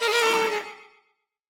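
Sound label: Woodwind death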